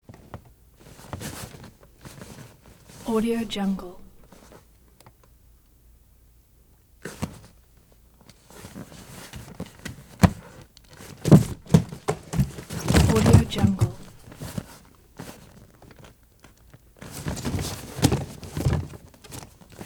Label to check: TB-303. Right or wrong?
Wrong